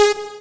SYN Poly G#; Best Service Gigapack 1

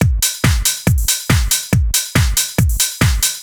Kick Loop, 140bpm

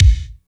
KICK, Tekno Industrial; East West

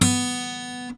GUI Ac., GUIT AC; Best Service Gigapack 2

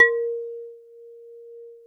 HARP A#3; Yamaha VP-1; Music Store; HARPOPHON

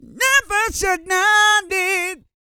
SING, Vocal Gospel; Spectrasonics; Vocal Planet

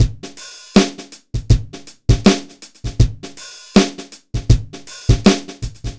BPM 80 AMB

